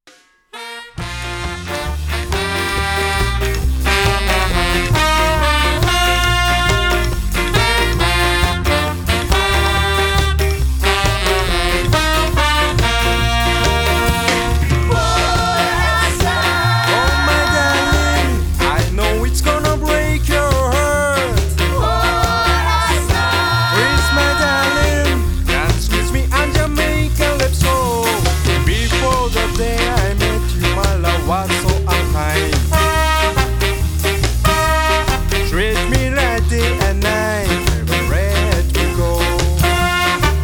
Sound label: ska